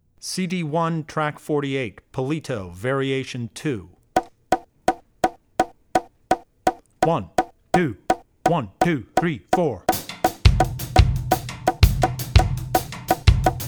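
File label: Palito (Variation 2), Drum Tracks; Drummer's Bible Tracks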